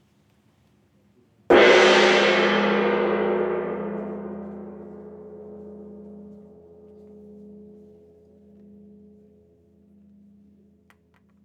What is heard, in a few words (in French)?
petit 1 coup loin droite, GONG VIBRATION